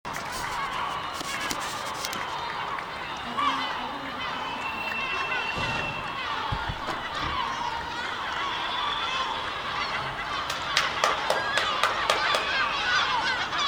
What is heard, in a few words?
cheering medical workers